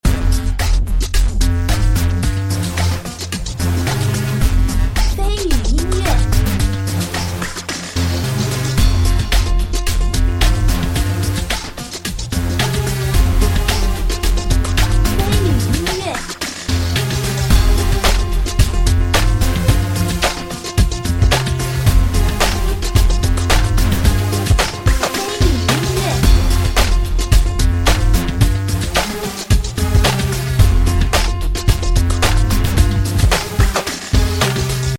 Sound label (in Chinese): 比赛 律动 紧迫 激烈